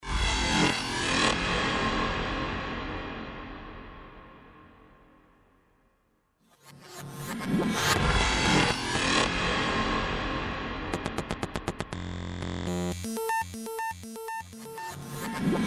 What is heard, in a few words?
experiment Squared waves; S-cape